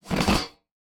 Science Fiction Sci-Fi Robot Slips On Space Ship Floor